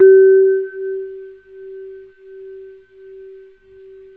bong, Synths; Notes

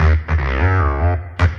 buzzed, Electronic Loops

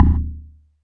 Ticks, Misc Percussion

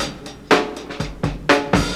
no kickz, drum loops

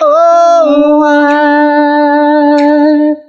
"oh why" sung; questions

